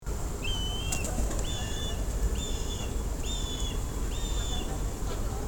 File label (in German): Schwarzmilan; Mag. Peter Holub